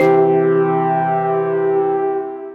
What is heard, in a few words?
Synth Keys